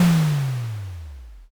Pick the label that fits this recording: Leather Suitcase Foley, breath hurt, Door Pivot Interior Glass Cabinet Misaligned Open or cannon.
cannon